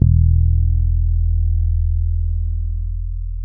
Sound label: E 2, ragga, bass; Spectrasonics; Bass Legends CD 1